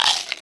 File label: player Eat; Feeding Frenzy